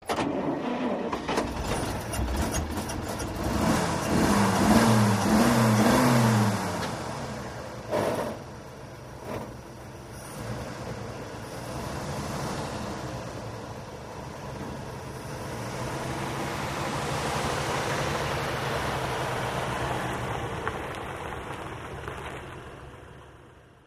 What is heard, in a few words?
Fire Truck Start